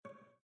Heritage Navigation Start, windows 7

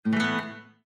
Battery Low; Windows 7; Heritage

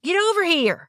beckon

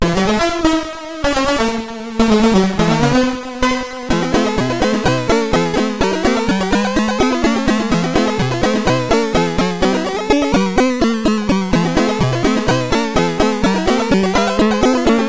In-Game (SID Stereo); west bank commodore 64; In-Game (Something Doing)

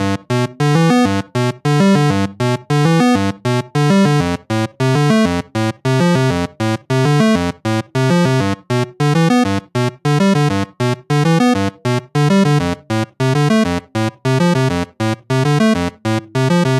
Retro Loop; Donkey Kong

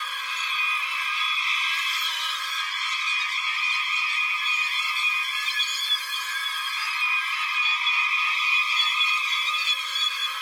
Drone Terror; Scourge Outbreak

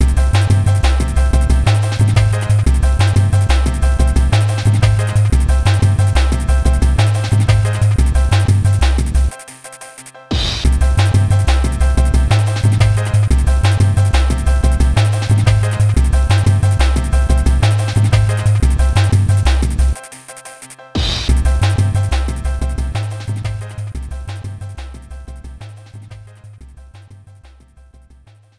Player Piano; Aero Fighters 3; Neo Geo; arcade

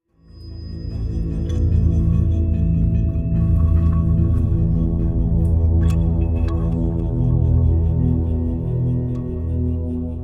sting Demons; the walking dead michonne 2 give no shelter

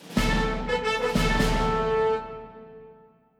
Army Success; Andy's Basement; Toy Story 3 The Video Game; PS3; Xbox 360; Xbox Series X|S

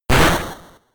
Hit Normal Damage

